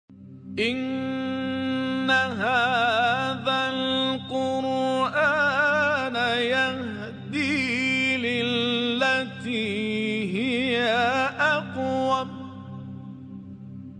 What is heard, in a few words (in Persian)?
تلاوت زیبای آیه سوره اسرا; احمد ابوالقاسمی